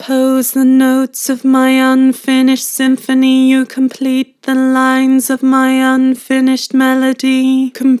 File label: Vibrato Compose the notes, Harmor Vocals